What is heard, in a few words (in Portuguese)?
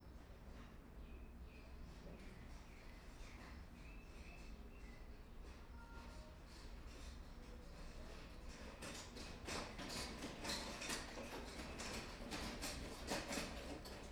Mala de rodinhas barulhenta passando por sala poucas pessoas, passaros; equipamentos